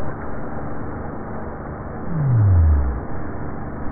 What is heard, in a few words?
sei whale